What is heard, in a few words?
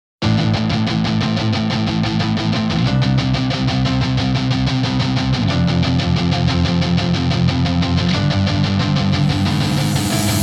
Instrumental; Yorinuki Gintama-san